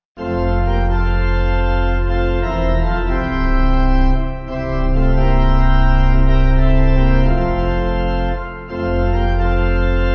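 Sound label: Pipe Organ; Sabbath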